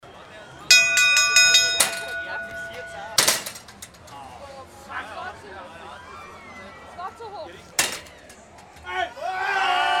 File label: shooting gallery